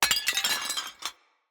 Vase Porcelain Small Break; Vases

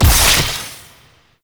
Damage Zone Place; Warframe; Nineteeen Ninety Nine; PvPvE

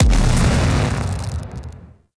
Explosion Large; Warframe; Weapons; Thanotech

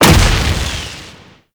Stadium Hazard Spark Projectile Impact; Gameplay; Warframe; Nineteen Ninety Nine

